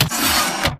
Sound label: Stalker Mvmt Servo Damaged Headpivot Medium; Titanfall 2